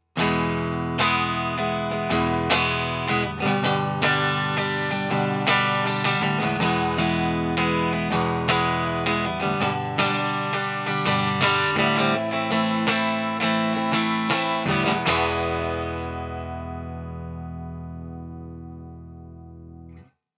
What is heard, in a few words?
clean floyd rose